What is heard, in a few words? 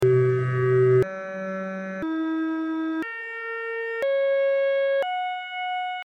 microtonal variations of the all interval chord akkord c g e b cis fis; akkord 9 c-2 g-2 e-2 b- cis-1 fis-1 sukzessiv